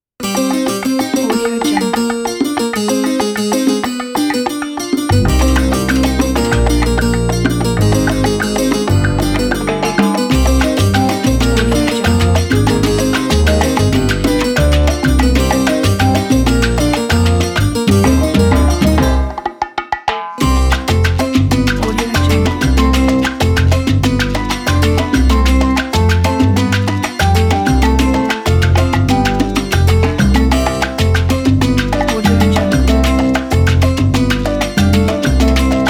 happy cuban salsa

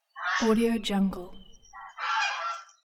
duck; premiumstocksfx

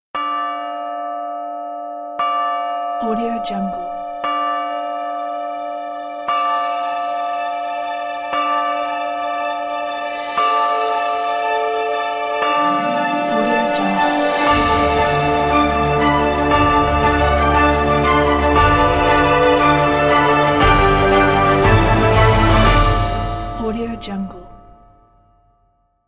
cinematic christmas ident

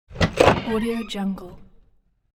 door open

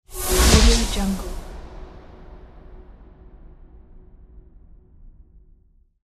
whoosh sfx; archisound

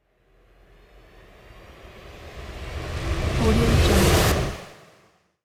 horror tension thriller

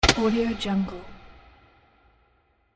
warehouse light switch

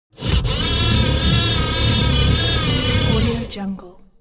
scifi mechanics servo large

